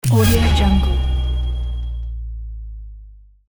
impact downer

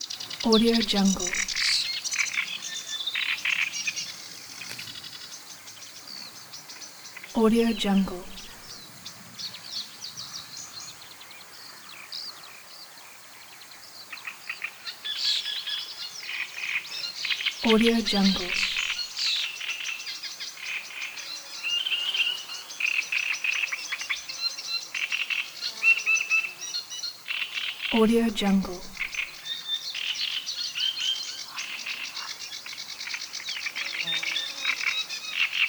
swamps bird

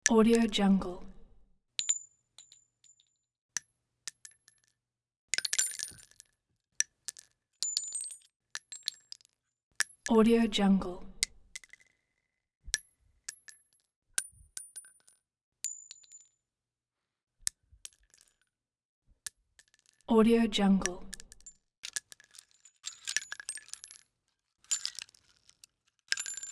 bullet shell drop

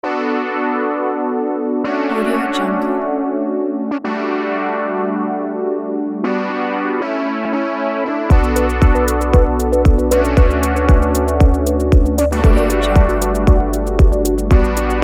modern abstract tech

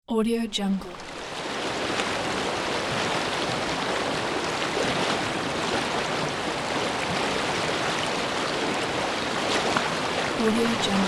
forest brook

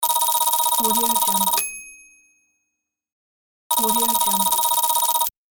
counter

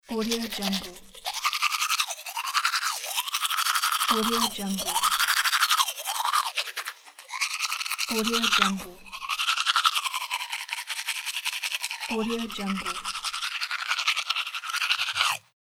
brushing teeth; monochromeeffect

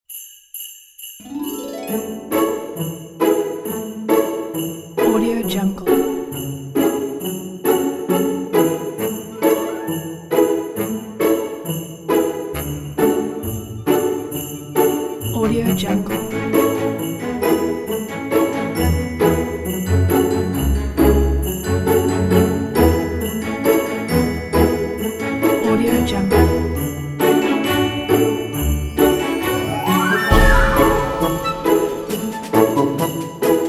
christmas funny music

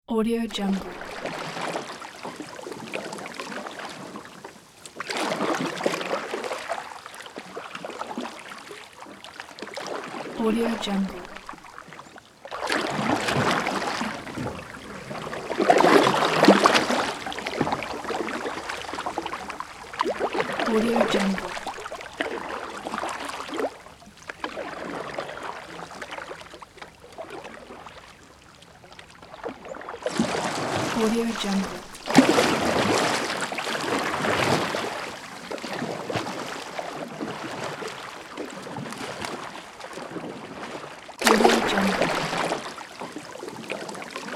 water splashing

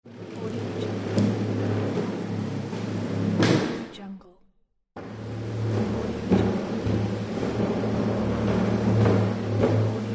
sliding gate

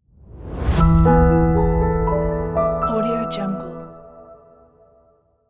short piano logo

